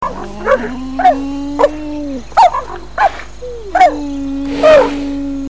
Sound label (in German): angstbellen, Geparden